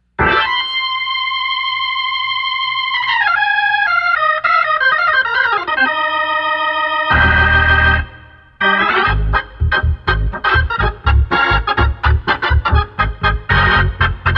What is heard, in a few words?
122xb Shout, hammond organ